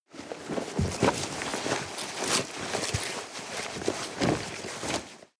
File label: nylon leather hard long